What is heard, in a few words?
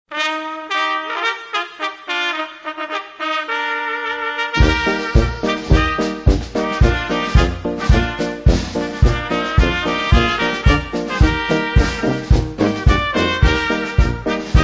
CHIMNEY SWEEP POLKA